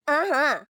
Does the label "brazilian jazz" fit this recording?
No